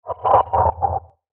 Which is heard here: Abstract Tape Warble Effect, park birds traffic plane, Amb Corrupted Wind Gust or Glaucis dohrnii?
Abstract Tape Warble Effect